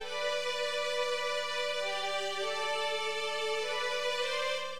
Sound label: STRING D7; Acid Jazz Funk; Acidpads